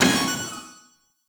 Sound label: Turnstile Open; Warframe; Entrati